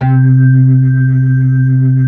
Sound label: B-3, Blues